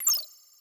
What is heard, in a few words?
Star Rod Fire; Super Smash Bros